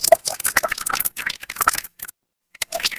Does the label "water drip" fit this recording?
Yes